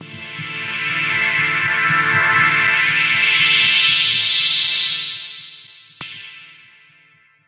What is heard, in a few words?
Melody, IDM